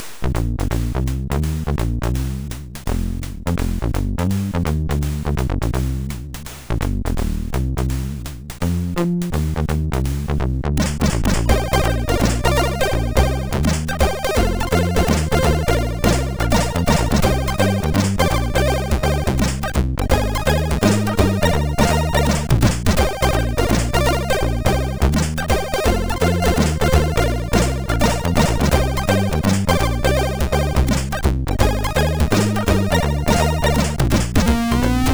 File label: Funky Feature, MOS6581; MOS6581R4